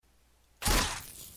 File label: Tazer Kill; CSS